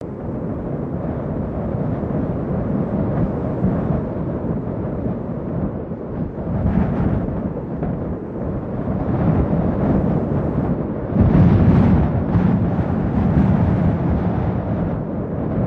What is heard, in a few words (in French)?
vent